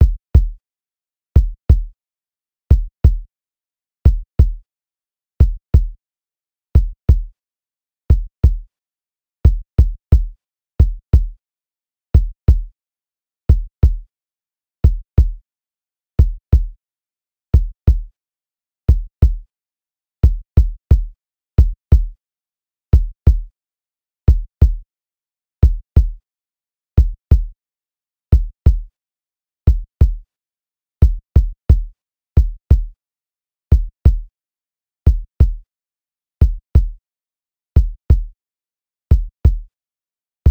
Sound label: Kick